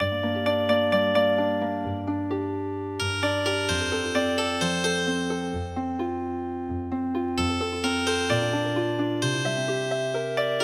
Healing Harp

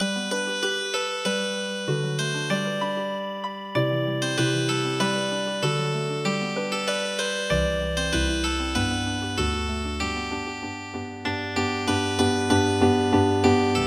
kono sake natsukashi Healing Harp

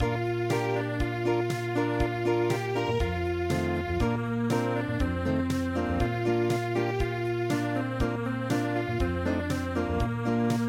Acoustic

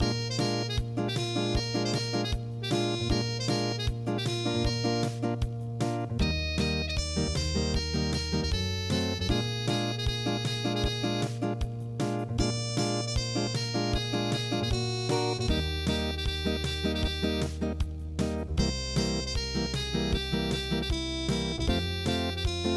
unpluged

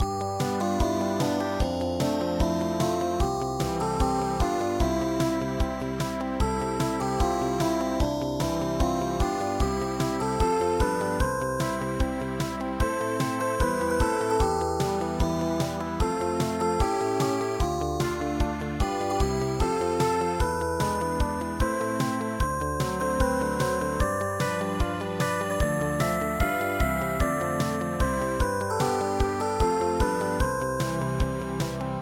ballade

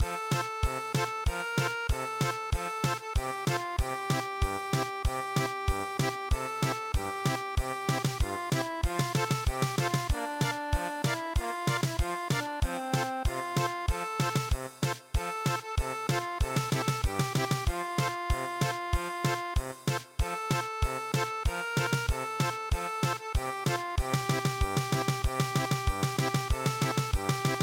we will not defeated German Polka

